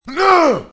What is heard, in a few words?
taunt; barb